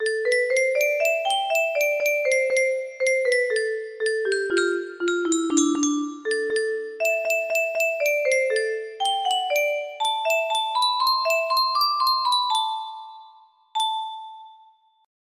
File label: music box